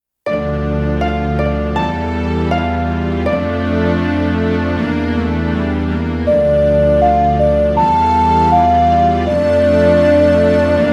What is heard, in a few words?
Ocarina; zelda; Ocarina of Time 3D